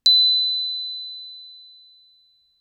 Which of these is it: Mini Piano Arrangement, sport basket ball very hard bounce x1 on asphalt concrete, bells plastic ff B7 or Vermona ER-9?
bells plastic ff B7